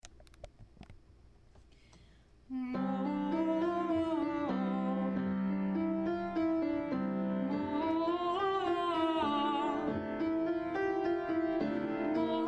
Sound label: Chord progression ma mo mi